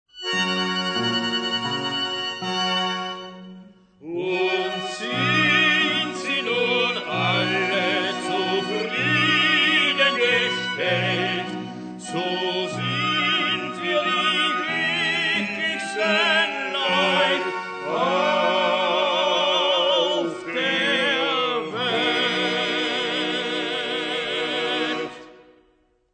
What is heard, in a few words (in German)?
Schlussgesang; Volkssanger-Komödien; Wiener Künstler